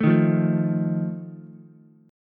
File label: Eb Suspended 2nd Flat 5th Ukulele, Ebsus2b5 chord; Ebsus2b5 strummed; JGuitar